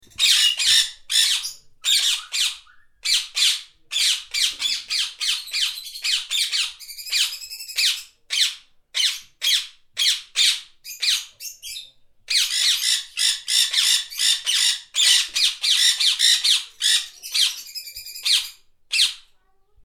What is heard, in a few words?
Parrot sound